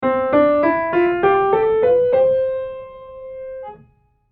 c major

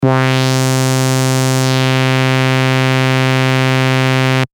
adsr

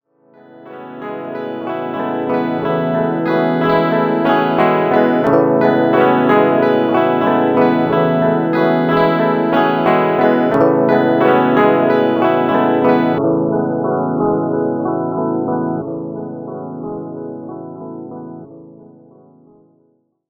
Digital Delay

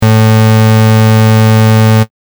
pulse

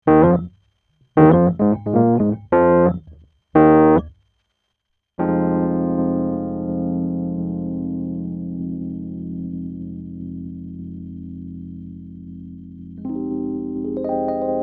Rhodes Dry

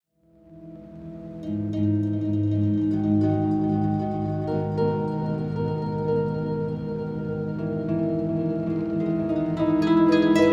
INPUT Delay

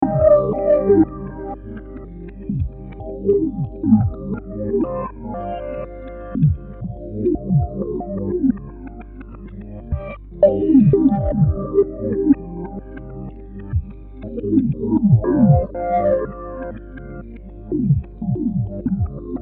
Live FX wet

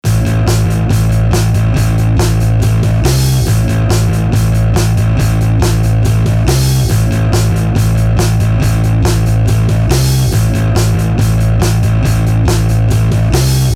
monster dnb

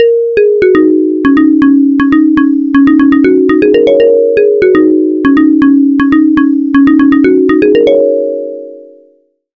pluck; juce

